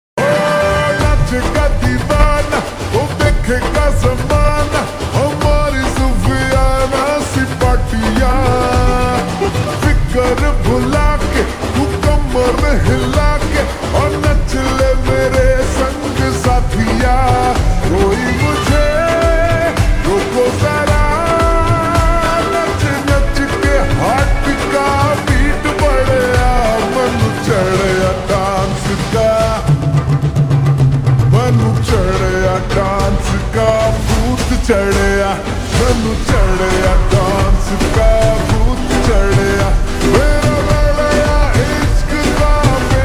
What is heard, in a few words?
Ringtone slowed and reverb